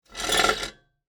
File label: Table Slide Back; Foley; Backward, Metal, Movement; Slides